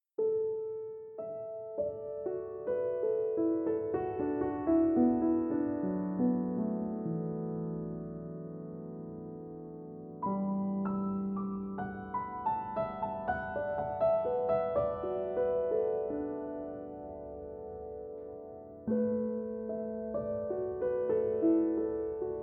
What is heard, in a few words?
piano sketches